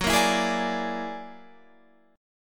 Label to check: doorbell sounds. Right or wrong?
Wrong